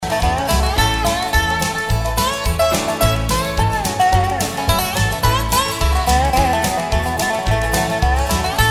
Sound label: dobro